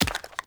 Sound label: Acorn Drop; Objects